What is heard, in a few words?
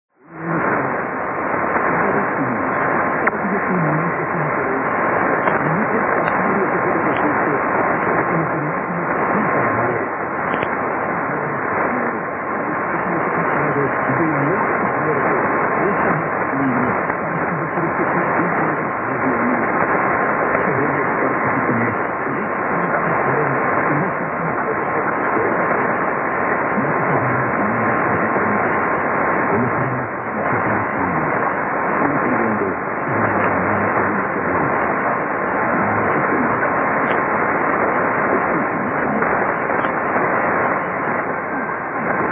6155.3kHz Radio; ボリビア; Radio Fides